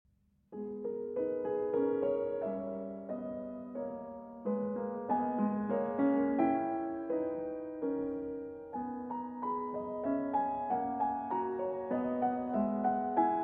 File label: Prelude & Fugue in G-Sharp Minor, BWV 863 I. Prelude